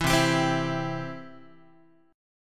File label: C Major, Guitar; strummed; JGuitar